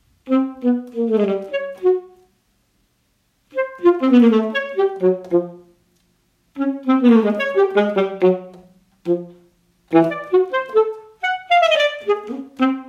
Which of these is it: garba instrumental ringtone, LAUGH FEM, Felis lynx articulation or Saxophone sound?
Saxophone sound